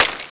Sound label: hk416 mag in, weapons; cso